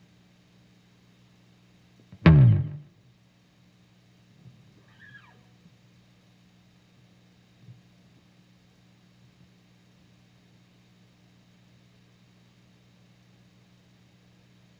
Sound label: bass slide